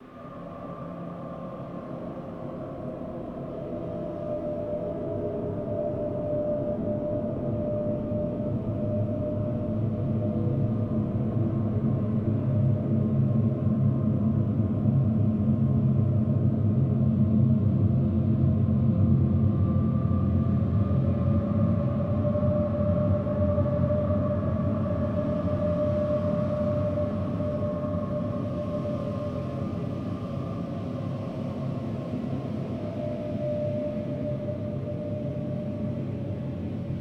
Space Wind

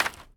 Pile Of Wood Planks

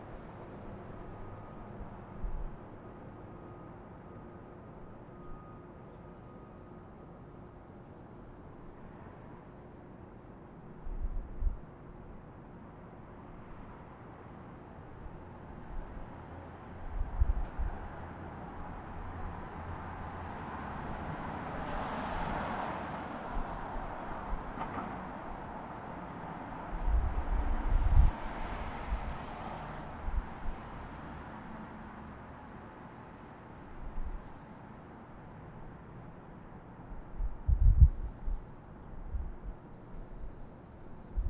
City Alley

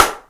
Slap

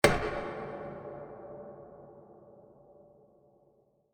Horror Thriller Metallic Impact

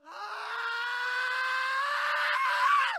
Reverse Guy Screaming

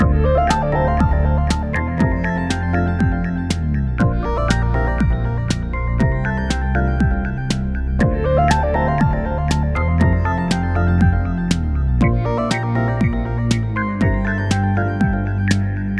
Background Sound Game Music Remix

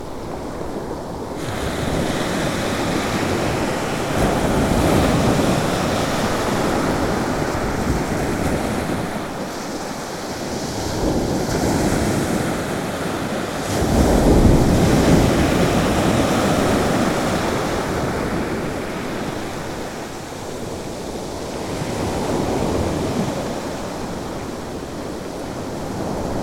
The Sea

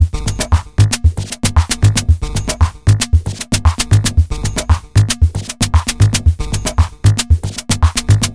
115 Bpm Stab Loop

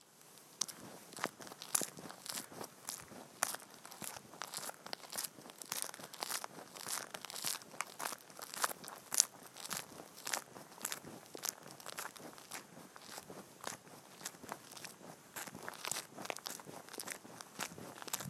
Walking On Paved Country Road

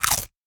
Crunching Chips

